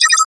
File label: button